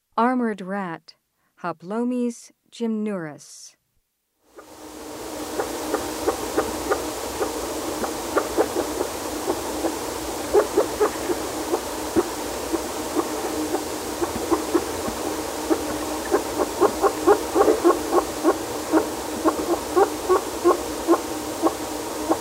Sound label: Armored Rat, Mammals of the Rainforest; S. America